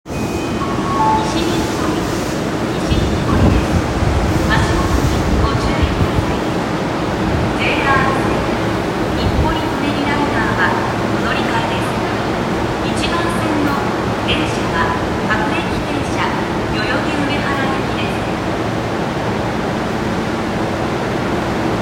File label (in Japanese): 千代田線西日暮里西